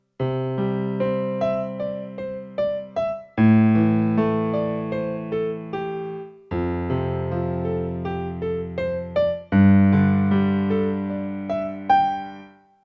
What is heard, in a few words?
song